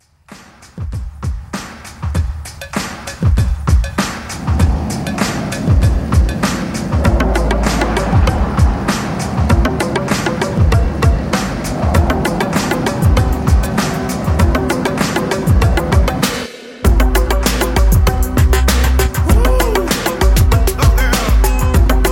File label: 80s Pop Re-Drum